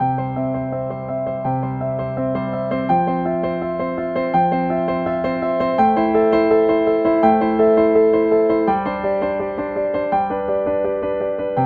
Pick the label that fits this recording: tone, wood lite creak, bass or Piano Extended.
Piano Extended